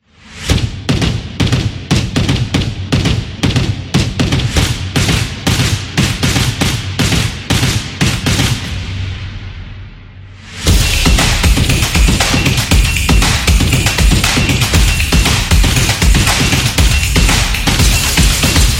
Energy Beat

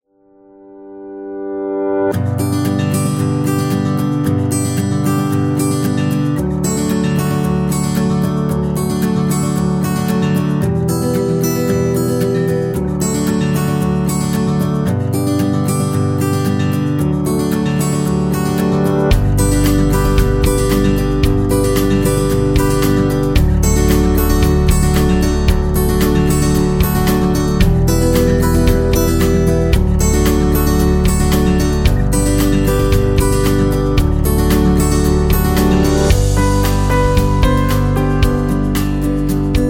Acoustic Inspirational

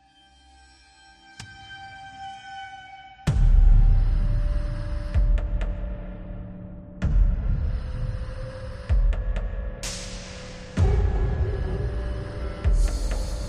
Horror Trailer; fear

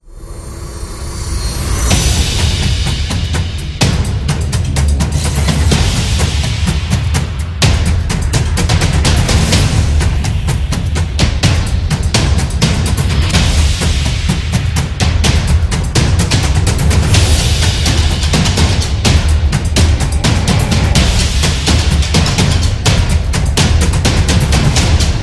Trailer Horror

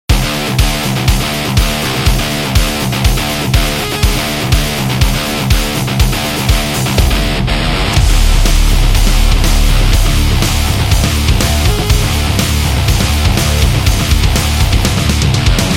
Metal Rage

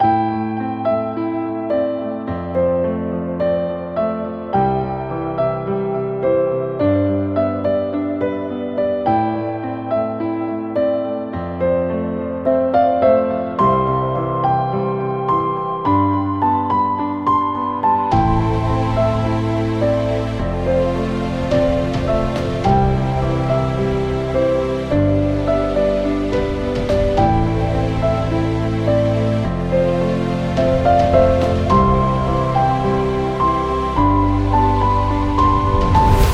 This Is Wedding, happy music; happy music vol 10

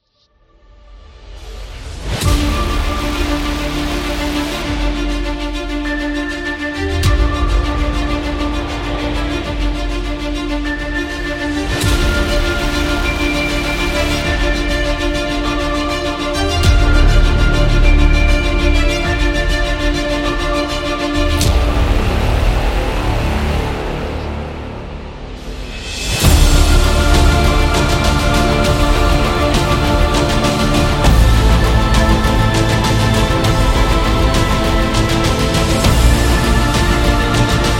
Trailer

